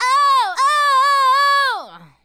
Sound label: Adlib, Hip-Hop and RnB Vocals; Sonic Foundry (Sony Creative Software)